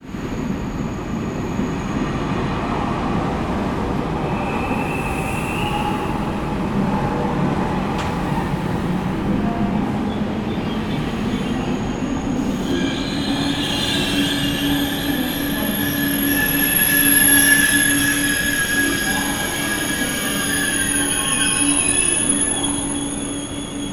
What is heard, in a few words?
Braking Train Tgv